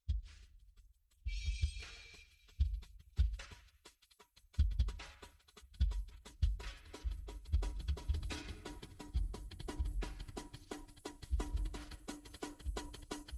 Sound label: Penumbra Phantasm drums (includes other sample unfortunatley); stems